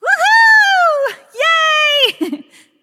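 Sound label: nonverbal yay; smite; voicepack; izanami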